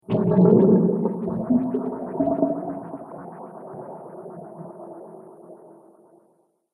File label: Alien Laser Pool; Alien Sound Effects; Science Fiction Sound Effect; Andrew Fleming